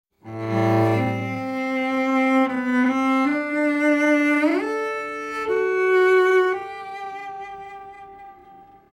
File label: Cello